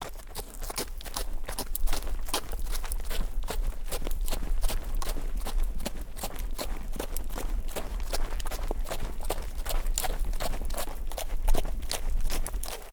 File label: horse walk